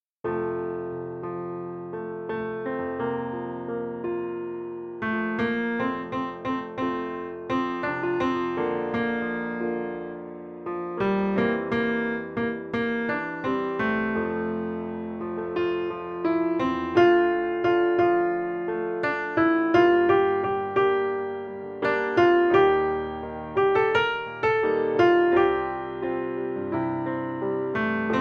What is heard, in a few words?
FOLK Melody